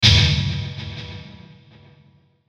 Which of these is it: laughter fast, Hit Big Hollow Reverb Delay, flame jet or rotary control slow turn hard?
Hit Big Hollow Reverb Delay